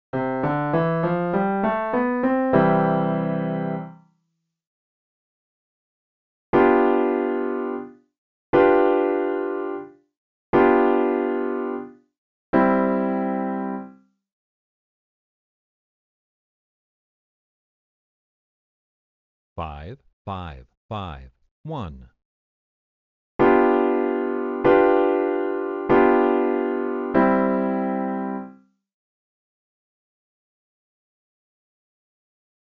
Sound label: I, V7 (medium); progressions